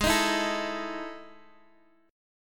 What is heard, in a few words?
E Major 11th chord